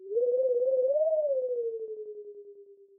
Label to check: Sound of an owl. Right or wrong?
Right